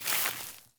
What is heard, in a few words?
grass step, footsteps; NS Studios - ultra sound collection